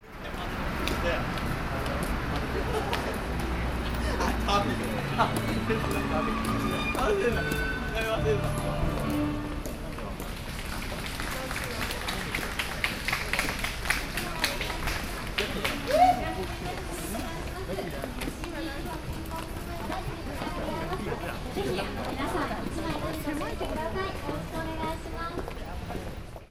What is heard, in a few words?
piano; tokyo